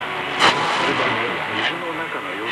1233KHz; Radio Monte Carlo Doualiya

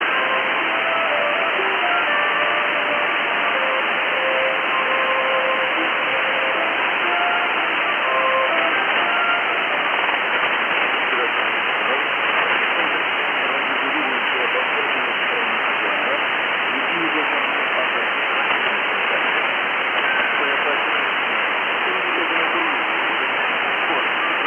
Kyrgyz Radio, 4010KHz

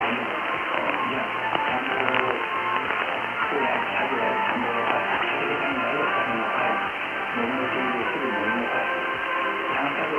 531KHz; AVTORADIO

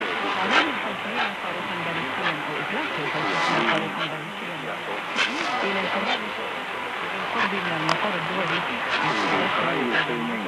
1233KHz; Radio Monte Carlo Doualiya

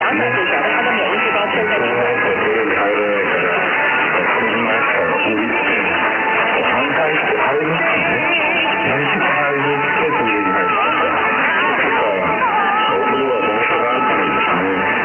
846KHz; DZRV

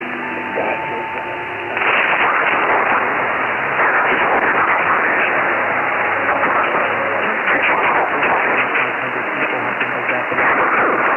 1044KHz; Metro Plus

